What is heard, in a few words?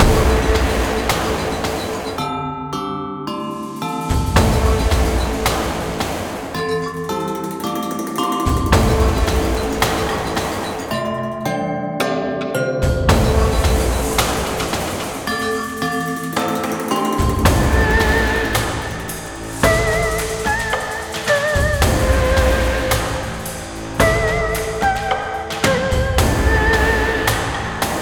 Loop